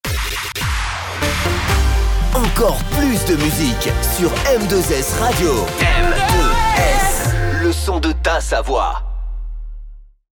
Jingle Chante; M2S

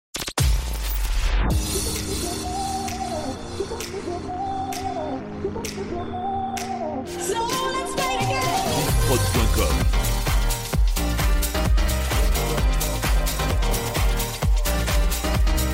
Medley Electro Classics 130 BPM

